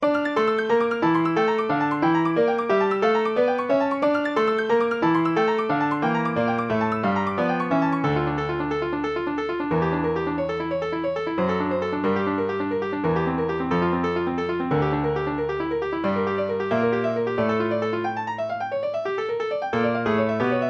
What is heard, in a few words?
piano